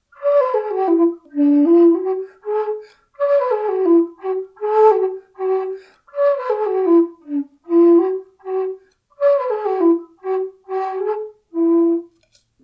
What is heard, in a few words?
bamboo flute, music samples; Coyote Oldman Anasazi-style end-blown flute, 2011.